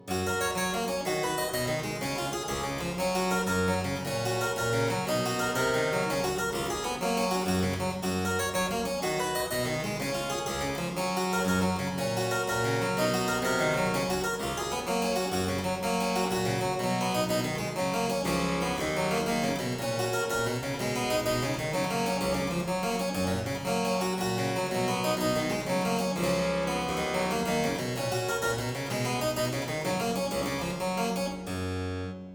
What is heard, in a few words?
Aria in G major Partita 23, Harpsichord; Buxtehude; Complete Harpsichord Music; Aria 'La Capricciosa'; BuxWV250